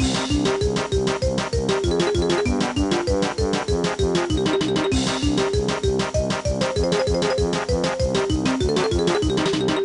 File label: bonus barrel; DK Isles